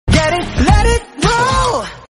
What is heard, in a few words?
notification